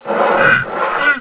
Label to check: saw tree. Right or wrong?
Right